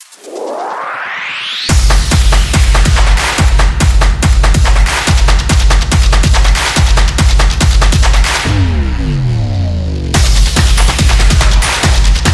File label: The Drums; high energy